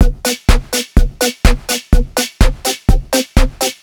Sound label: 125 bpm full, combi loops, nu dance electro; Zero-G; Soundclash; Sticky Bits